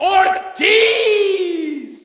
Shout, audience; UFC Tapout 2

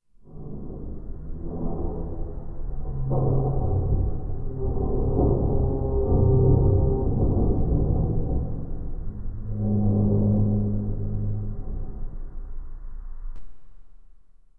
Metalic Moan; Myst III Exile

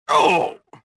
pain; reborn

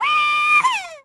yell; Matt Hoffman's Pro BMX 2; XBOX

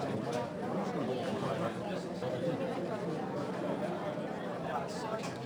crowd, ambience; Soldier Of Fortune II Double Helix; XBOX

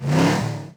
car rev; Matt Hoffman's Pro BMX 2; XBOX

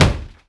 Ball Hit Hoarding

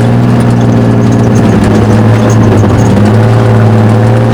truck run; Soldier Of Fortune II Double Helix; XBOX; ambience

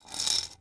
metal slide Concr sm; objects; Star Wars Obi-Wan; XBOX

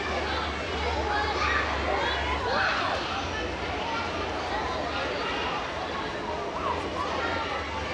Children Playing Loop, Park

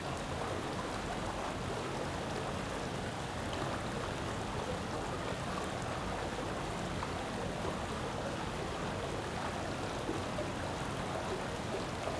ambience aquaduct; Star Wars Obi Wan; XBOX

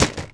bouncy tv hit; bouncy objects; Tony Hawk's Project 8; XBOX

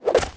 Spin Done, weapons; Soldier Of Fortune II Double Helix; XBOX; silver talon

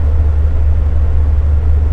Rumb Vent; Star Wars Knights Of The Old Republic II; XBOX

